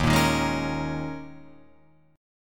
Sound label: D sharp 7th Suspended 4th, Guitar, Open F